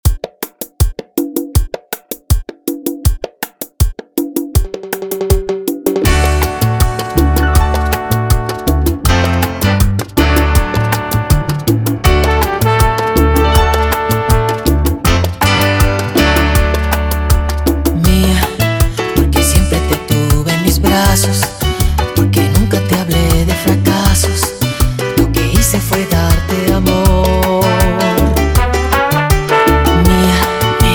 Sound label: directo 80bpm